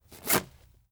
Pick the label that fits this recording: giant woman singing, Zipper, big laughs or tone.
Zipper